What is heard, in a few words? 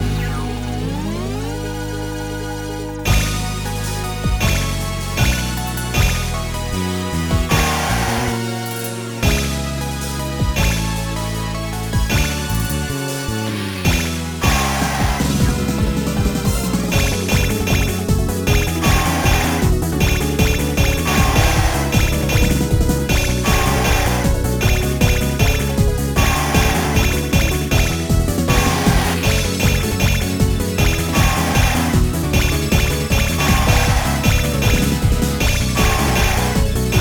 Harp Note Sound Hard; Ryusei no Rockman Denpa Henkan On Air